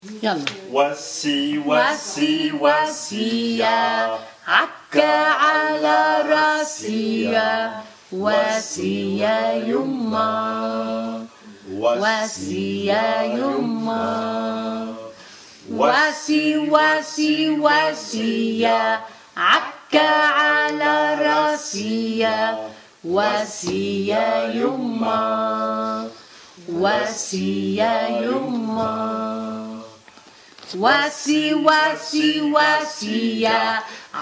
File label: Tutti